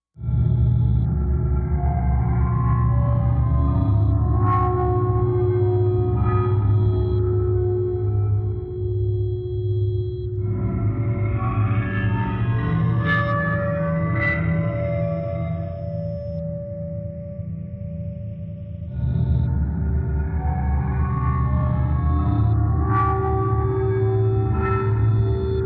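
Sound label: Distant Metal Machine Low, metallic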